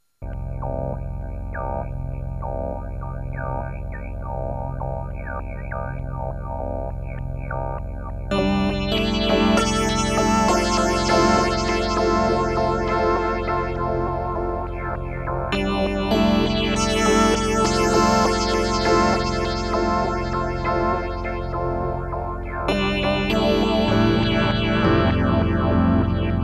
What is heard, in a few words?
hi cut stereo, multiphase; Scott Stites